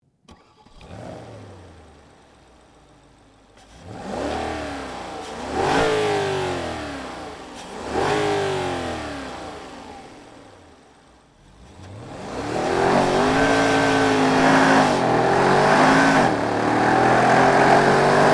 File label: Porsche Panamera Turbo S